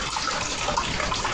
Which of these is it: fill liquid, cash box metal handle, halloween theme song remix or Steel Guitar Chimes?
fill liquid